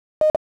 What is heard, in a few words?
SINGLE CYCLE 1K